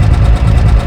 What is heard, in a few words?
engine idle loop